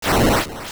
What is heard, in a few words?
Enemy Die; An enemy killed